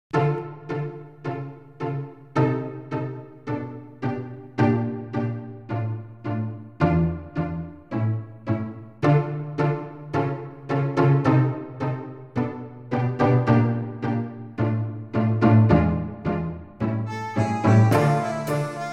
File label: Canasta tango